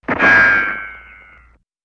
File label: Ricochet, bullet bys & riccos